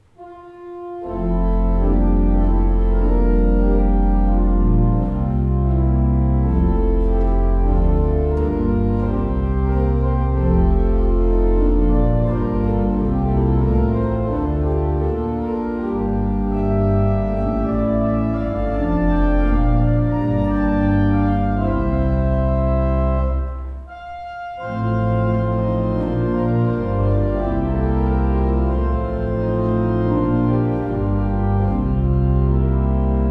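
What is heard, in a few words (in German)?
Orgel, pfeifenorgeln; Conacher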